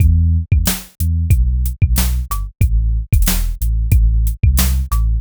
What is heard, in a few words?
rnb beat bass groove 92bpm